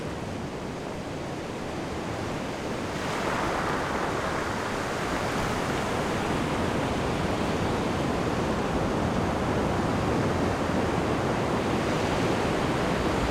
Big Surf, Ocean Waves; The Natural Sounds of the Wilderness; Echoes of Nature

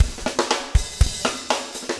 4 on floor 120, loops, 120bpm

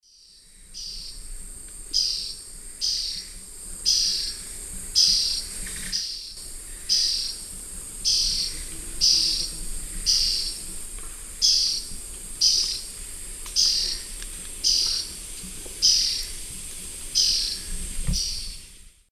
Myrmotherula hauxwelli, alarm call; S. America; Eastern Lowlands

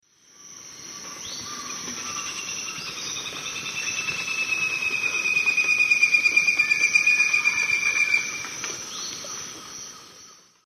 Leucopternis schistaceus, song; S. America; Eastern Lowlands

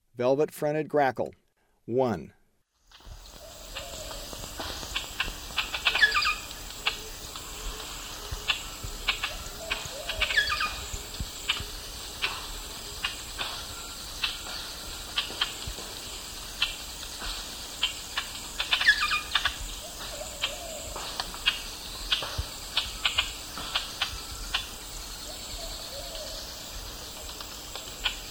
Velvet-fronted Grackle; S. America; Eastern Lowlands

